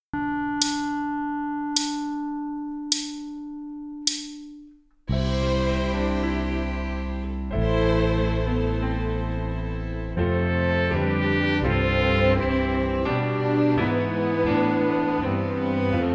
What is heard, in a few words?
Accompaniment